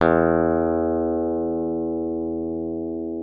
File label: PLECTRON FX E2; Xsample VOL-1; 90 s Sample CDs